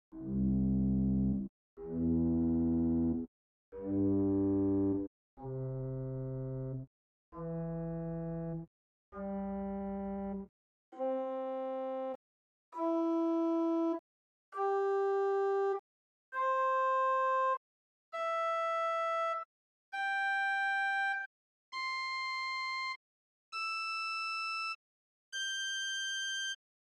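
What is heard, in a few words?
Salicional arpeggio, organ; Romanswiller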